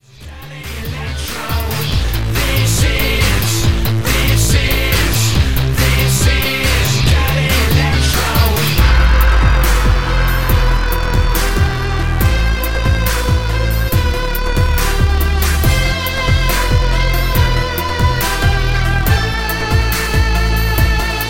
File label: Dirty Electro